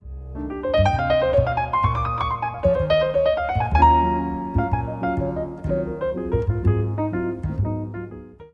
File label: piano